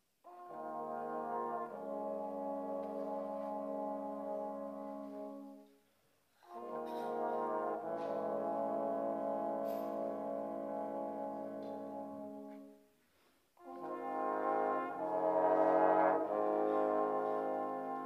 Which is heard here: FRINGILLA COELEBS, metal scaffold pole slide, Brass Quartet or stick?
Brass Quartet